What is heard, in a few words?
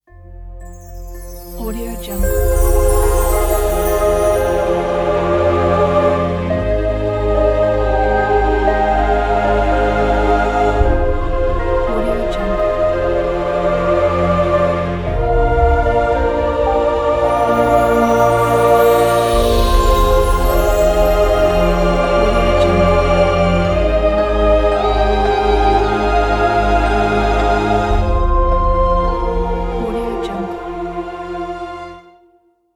fantasy fairytale ident